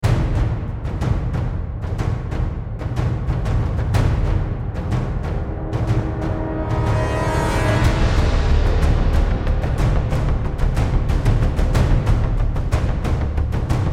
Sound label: Drums Of Doom; Disney Infinity 2.0 Marvel Super Heroes